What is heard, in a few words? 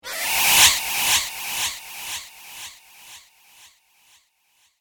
Force Swoosh With Delay, Whoosh; Whoosh Sound Effects